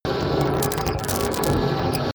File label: sizzle straw machine, sciene fiction